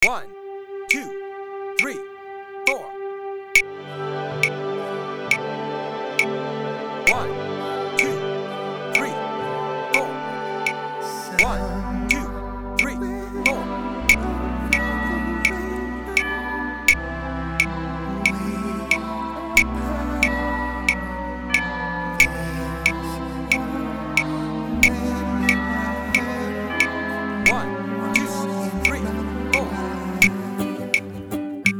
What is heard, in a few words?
BAND